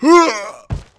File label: die; Eft; FIREMAN